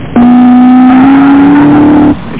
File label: CAR; SoundEditor